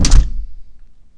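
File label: squeaky shut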